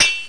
chink; Total Chaos Nukem 3d Pack; duke

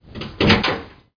Door Open

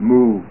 Move; ABE Responsive Text Demo; BED.demo

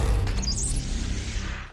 Eerie; Willard Press Kit

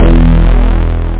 MELLO VIB; Groovy Bytes: Behind the Moon

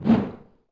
Swoosh Weapon; Civilization4

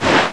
crunch

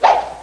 SWORD

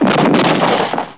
explod; thexder

